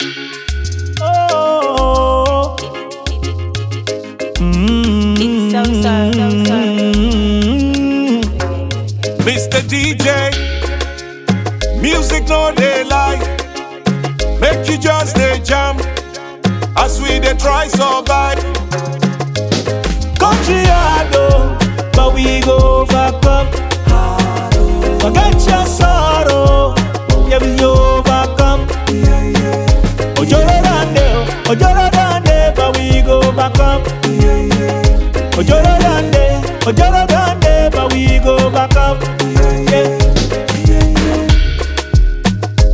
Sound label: Country Hard Instrumental